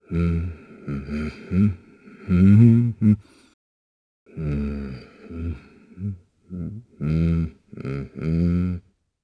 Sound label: Hum, jp; Chase; kingsraid